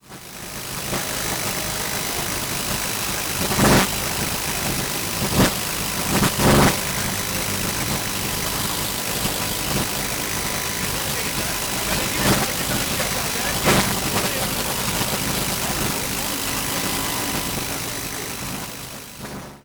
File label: crystal set